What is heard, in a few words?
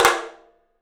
OPEN TOM; 3D Sound; 3D Drums